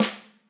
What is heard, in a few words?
Ludwig 14 Snare; RED ZEPPELIN